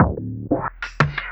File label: Orbish Funk, Synchro Funk, Beats; Sonic Foundry (Sony Creative Software)